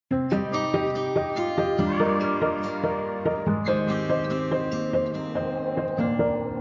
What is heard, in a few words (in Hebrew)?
פתיח קלל